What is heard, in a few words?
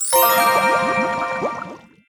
free spin init; Amatic; Mermaid's Gold